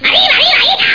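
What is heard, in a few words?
lemmings ship explosion; lemmingoids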